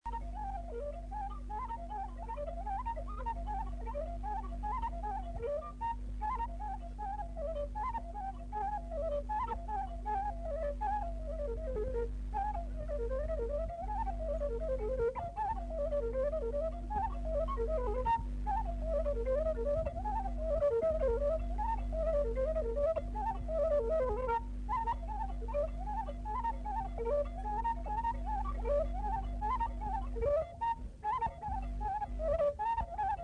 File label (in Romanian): Burdui la fluier; jocuri si cantece batranesti; Vaslui